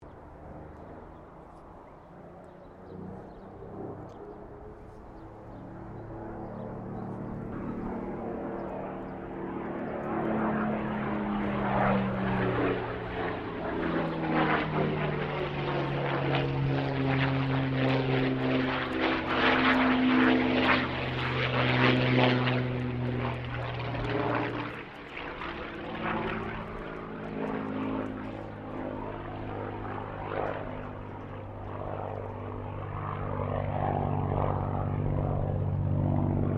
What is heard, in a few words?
AIRCRAFT SUPERMARINE SPITFIRE MK-VIII PASS; Airplanes; Vehicles